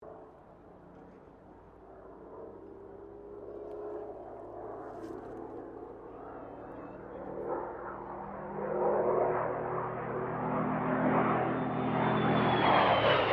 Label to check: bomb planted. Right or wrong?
Wrong